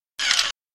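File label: Mechanism Fast On off, servos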